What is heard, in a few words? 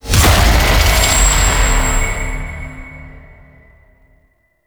Mission Speed Up; Entrati; Conquest